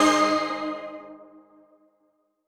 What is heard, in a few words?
Old Skool G#3